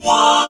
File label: TALKBOX